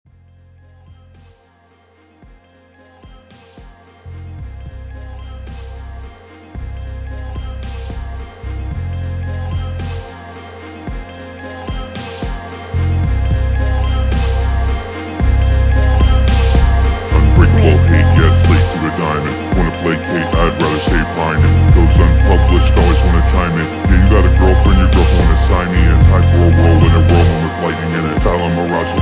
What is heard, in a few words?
bass lower w lyrics